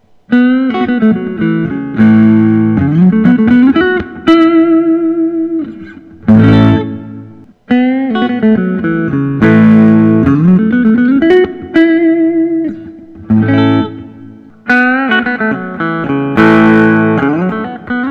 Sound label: collings i 35 deluxe; tobacco sunburst